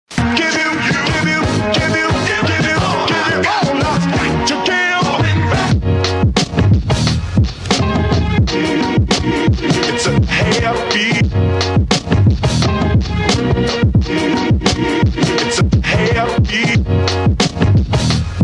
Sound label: Bonus Beat